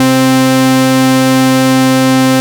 MC 202, BASSES; Masterbits - Vintage Voltage; VV BASSES

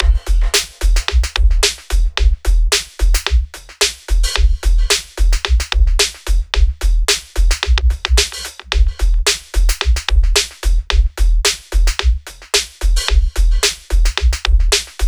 junk hip hop 110bpm

